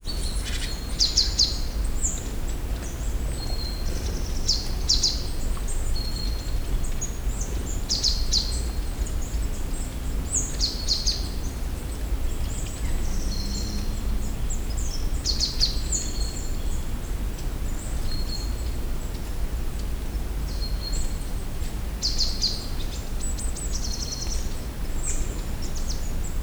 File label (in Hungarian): egyik hangja visegrádi hegység, csuszka; termeszetben